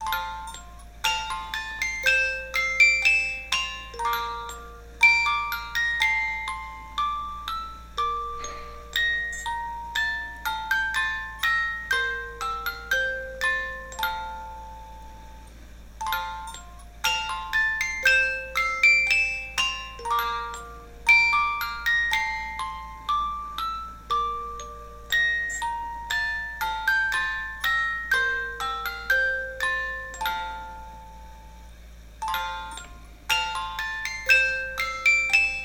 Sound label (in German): Spieluhr Grosse Spieluhr